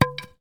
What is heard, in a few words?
Baseball Bat Fall